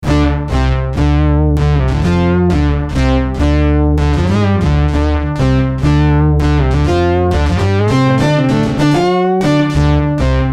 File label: Preset Mood Bass